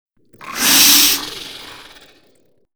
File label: greetings reptilian special; Stellaris